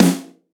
round snare sample f key, steel snare drum sample tuned to the F note; snare drum samples